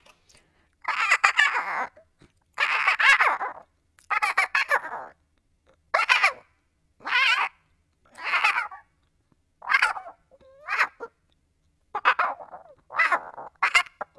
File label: Raccoon Voice Shifted